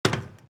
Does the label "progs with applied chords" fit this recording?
No